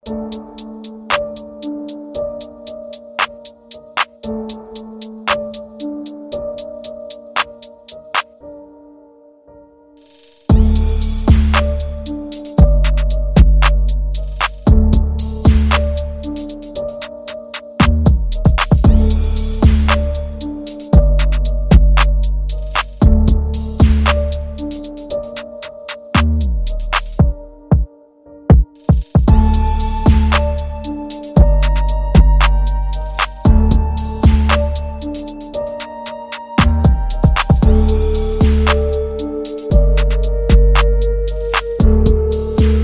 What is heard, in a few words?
glock DARK TRAP BEAT 115bpm